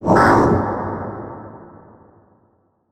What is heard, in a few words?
Production Element Title Transition Demonic